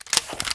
Rifle Unequip, Sniper Rifle

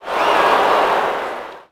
Ooh, Crowd, reactions

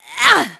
GRUNTS; VOICE; CARENA; Pulse Racer

